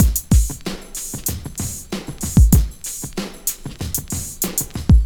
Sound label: kicks double